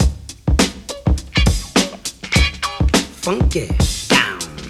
102 bpm drum beat c sharp key

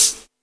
raw hihat sound g key

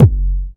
boomy steel kick drum f sharp key; kick drum samples

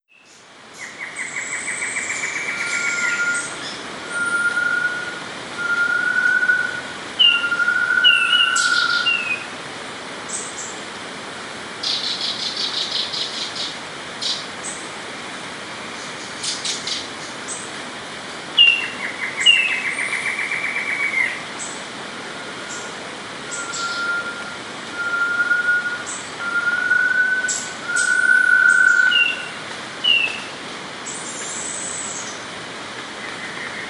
squirrel monkeys squeaks

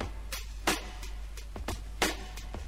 89 bpm high quality drum beat f sharp key

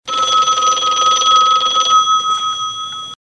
rotary phone ringing with echo

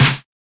old school steel snare drum c key

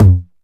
tom drum single hit f sharp key; tom drum samples; one shots

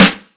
studio snare one shot f sharp key

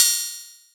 crash single shot a sharp key; cymbal drum samples

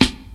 natural acoustic snare b key

